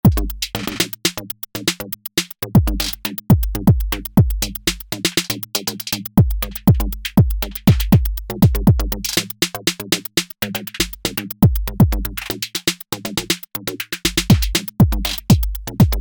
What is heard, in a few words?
drums